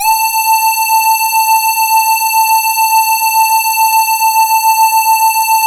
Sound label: SAW, Synth and Organ